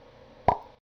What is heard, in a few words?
Tennis Ball Hit